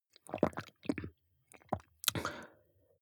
drink glass milk swallow small

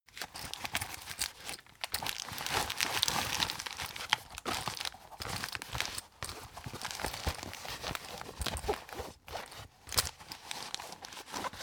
plastic bag handle aggressive long

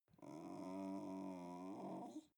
dog snarl aggressiv

